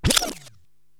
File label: DJ Reverse